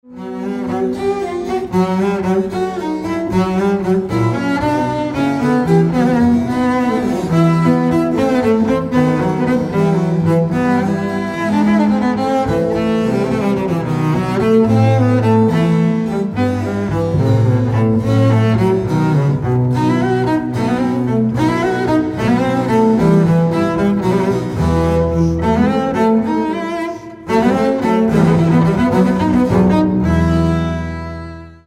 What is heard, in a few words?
Sonata; A Vivaldi